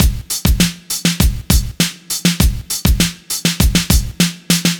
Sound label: No Perc, Miami Drum Machine, 100bpm, Drum Loops; Zero-G; Soundclash Nu Dance Electro; Acidized